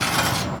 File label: Castle Sculptures, percussion; Downtown Houston Root Memorial Square